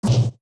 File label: Banker Stamp; Clock Town Banker stamping Link's hand